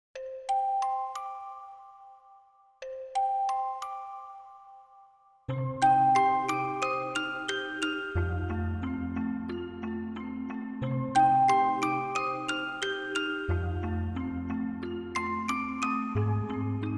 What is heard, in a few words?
Rain falling in my heart - Orgel ver.; Symphonic Rain